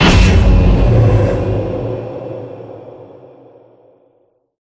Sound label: Stinger; Shadows of the Damned